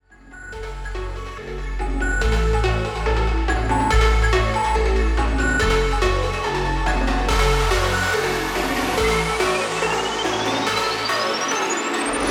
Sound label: Acid-Phase